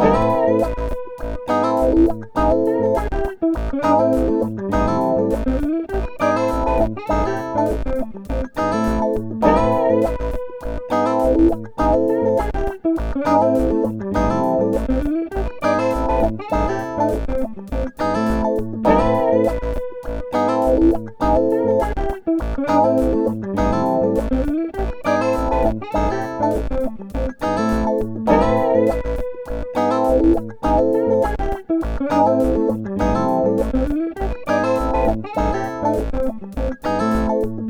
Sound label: drums